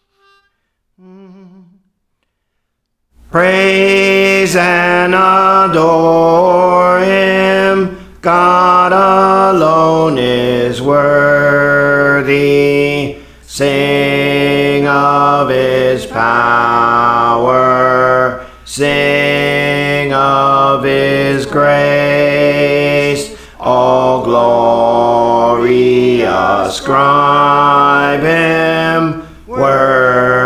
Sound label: Bass, Praise For The Lord Training